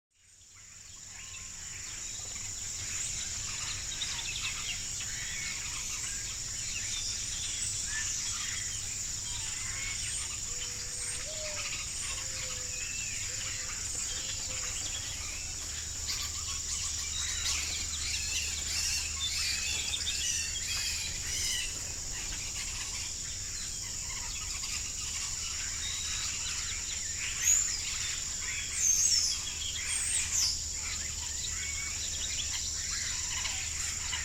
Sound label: Early Morning Farm Sounds Birds Dawn Chorus